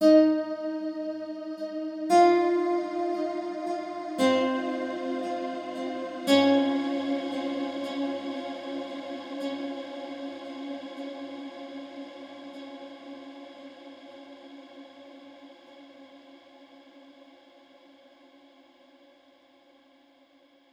Overlay Tone; The Shard; Mirror's Edge Catalyst

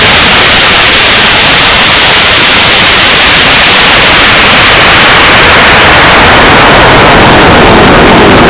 jump; mortimer

